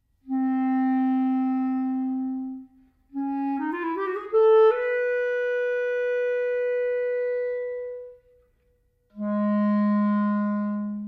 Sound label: wind quintet, andante